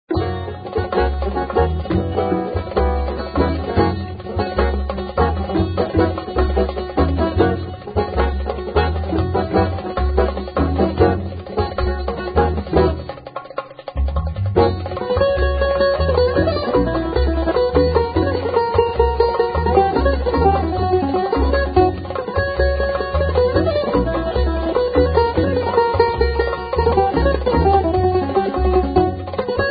Tamburitza

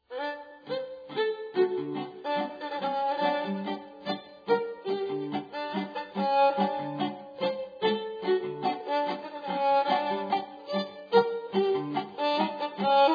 Romanian Tango

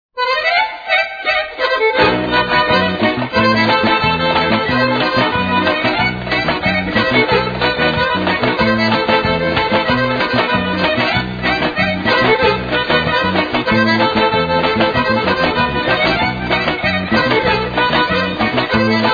Turski instrumental

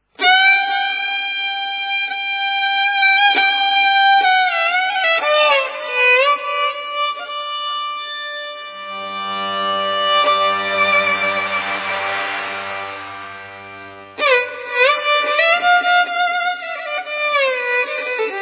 Improvisation dance

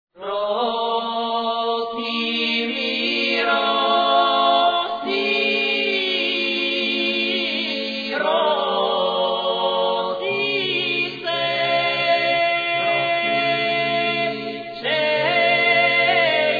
choir

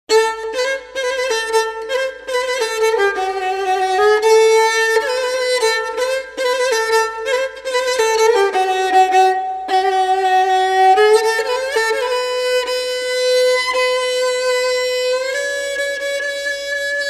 gadulka; Stringing instruments

